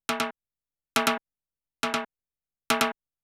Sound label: DELAY TIMB, Techno 138 BPM; Best Service ProSamples vol.54; AKAI